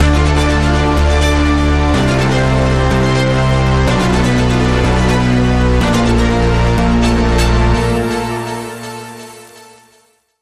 Link Sting

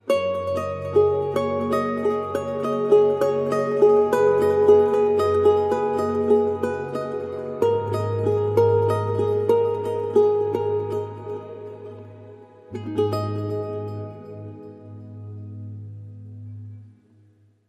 Charango

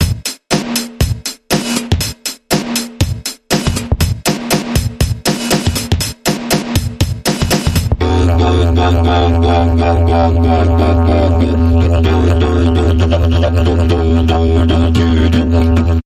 Percussion Bumper Reversed

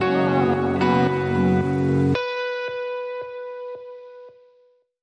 Bumper

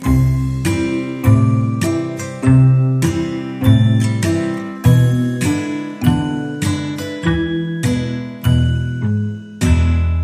Sting Mysterious

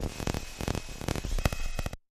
Static Popper Transition

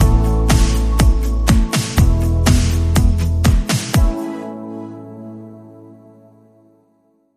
Bumper

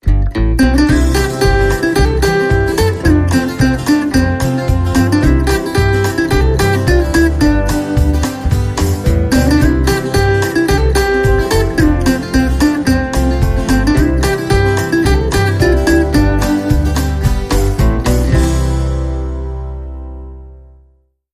Cowboy Bluegrass, Jingles

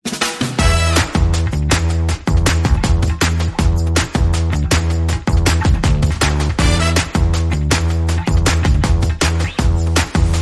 No Vocals

